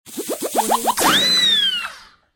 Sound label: cartoon takes off in a hurry